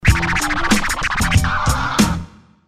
Scratch Tone

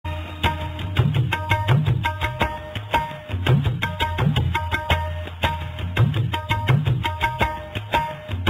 Tabla Beat

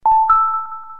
Wii Channel Ding

